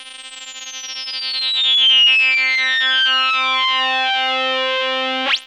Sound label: PITCH FALL, SYNTH FX; AMG - Vince Clarke Lucky Bastard